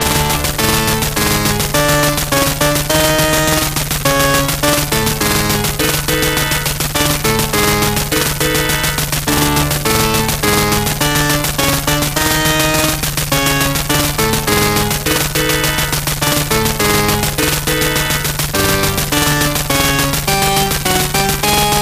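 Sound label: background music; Legendary Wings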